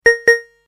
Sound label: bell ringtone